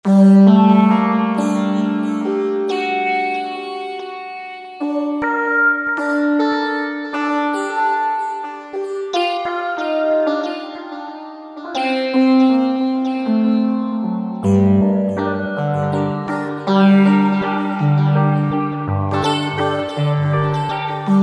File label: Vocalese, Roland V-Synth; Factory Patches